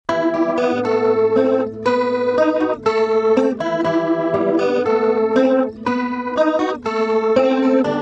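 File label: acoustic guitar cls222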